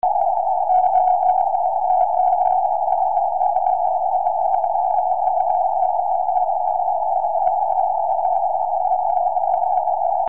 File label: cw; eme